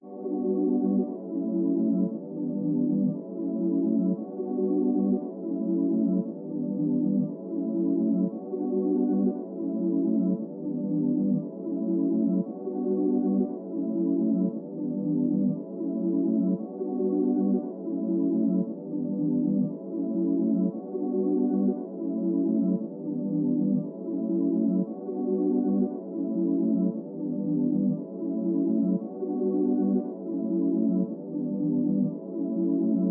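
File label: Pad Loop B minor 116 BPM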